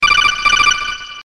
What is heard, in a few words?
Codec; Mgs